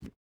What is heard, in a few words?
footstep rubber boots concrete jump start; Rust